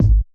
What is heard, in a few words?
full kick